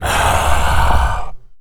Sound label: zombie attack; DeadFright